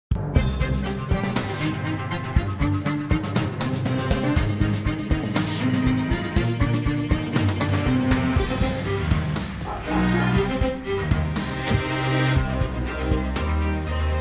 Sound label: car commercial